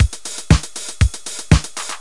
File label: D-Kit